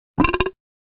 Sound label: burping sound; pipe bug